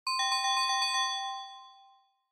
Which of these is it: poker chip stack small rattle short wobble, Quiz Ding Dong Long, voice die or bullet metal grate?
Quiz Ding Dong Long